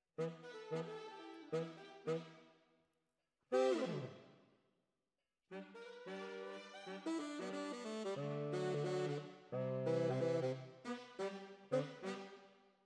Bari, RNR for piano

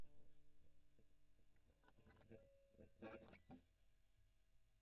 E. GTR Gefell

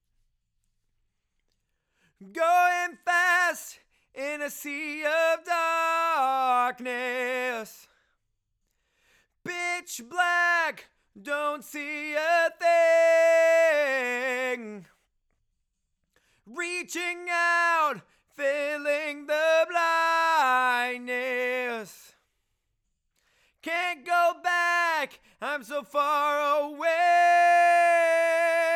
LV Choru

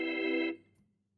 Hammond

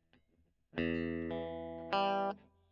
E. GTR Gefell

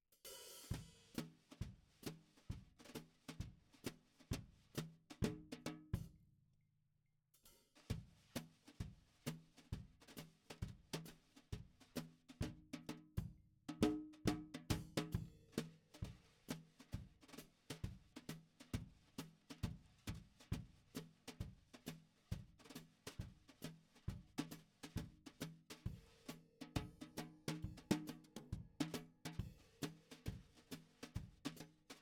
SNR top

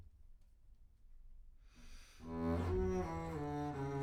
Bass Room

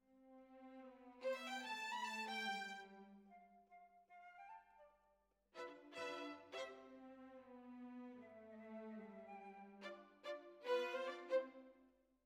Violins, jazz for piano

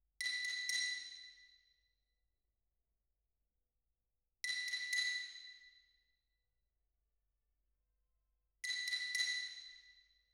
Twin R Xylo

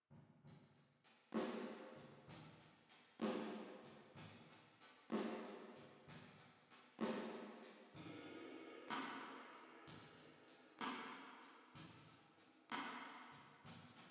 Drums Verb

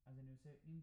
Piano Mono

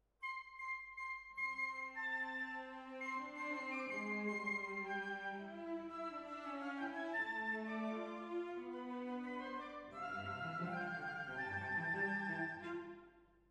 Hall

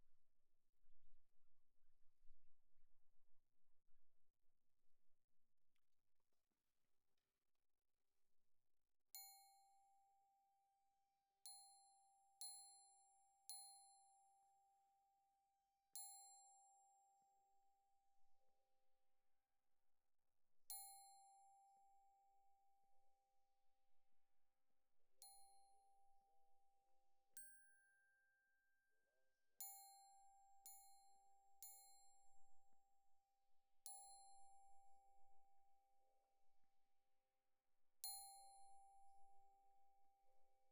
Glock Close

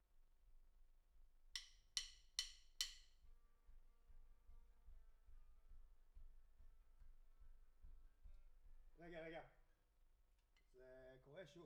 Room

